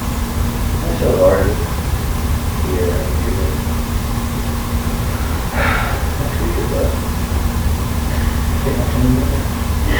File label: 1st Floor Bedroom Unexplained Sigh Amplified; Turner Home